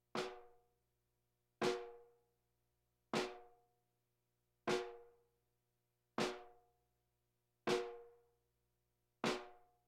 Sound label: Percussion Bongos Tympani rolls Gongs Drums